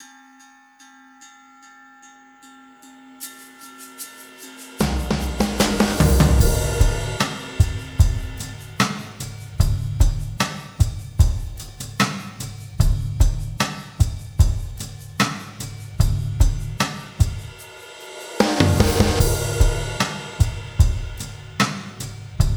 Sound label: Drums